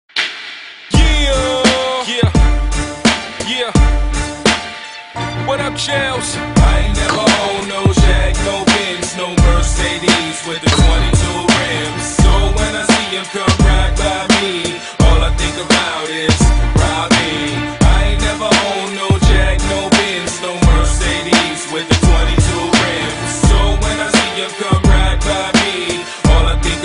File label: gangster music; hip hop